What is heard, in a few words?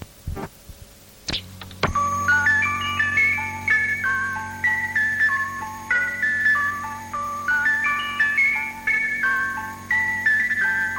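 Swedish Rhapsody Melody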